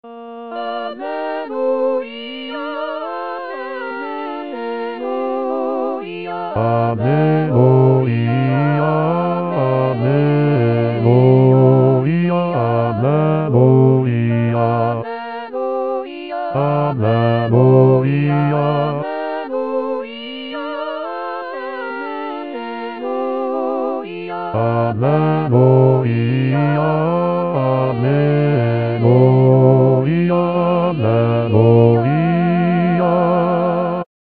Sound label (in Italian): alleluia basso; gabrieli